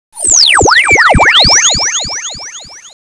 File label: space buzz; Sound Effects for Multimedia - Volume 1; electronic sounds